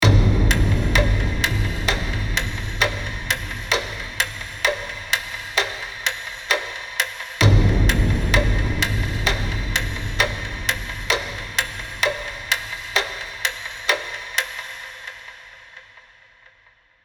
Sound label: Scary clock ticking suspense tense